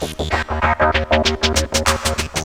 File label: Fills, Roland; Sound & Vision - Gigapack I; Perc